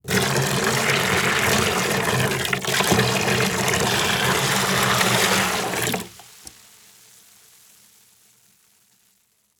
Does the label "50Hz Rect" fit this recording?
No